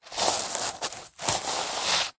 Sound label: household color changing reversible sequin cushion rub; zapsplat